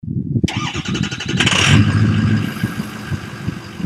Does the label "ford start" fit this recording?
Yes